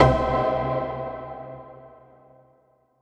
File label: C STAB; funky; Loopmasters; Funky Underground Vol 2